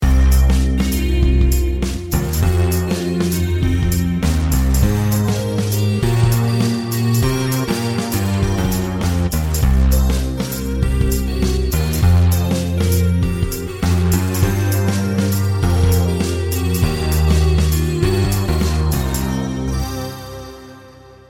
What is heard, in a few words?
Vibe Ringtone